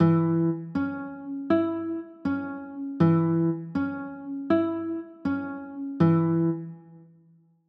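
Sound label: G flat 6 chord, guitar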